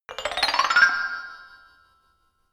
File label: notification glockenspiel low slide up; instrumente